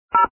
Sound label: DTMF 3